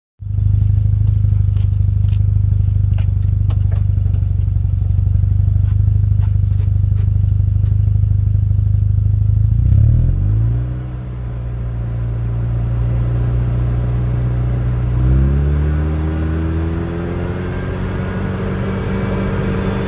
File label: rx7 exhaust; 2nd generation 1986-1992; did some exhaust mods